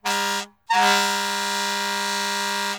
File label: fire truck air horn; daniel simion